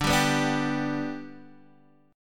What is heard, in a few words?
D 6th Suspended 4th D, chord; D6sus4 strummed; JGuitar